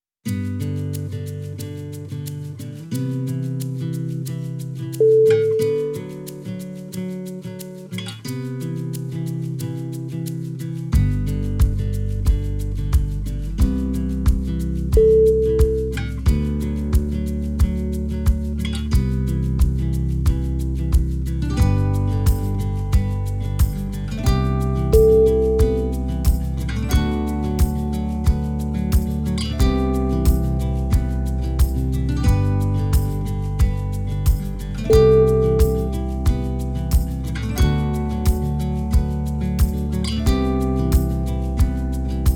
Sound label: inspiring autumn